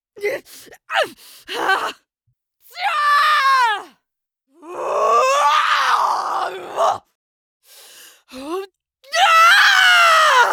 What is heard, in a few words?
Combat and Damage Efforts